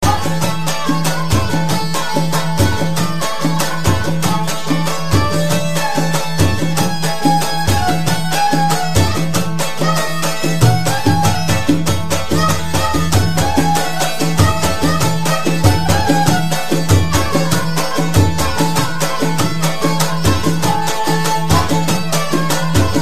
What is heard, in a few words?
balochi music